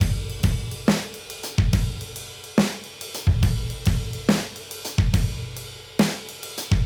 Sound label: Modern Breaks; Touch Loops; ClassicBreakSessions